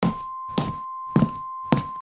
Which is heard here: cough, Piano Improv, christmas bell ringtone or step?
step